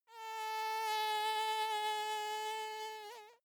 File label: mosquito buzz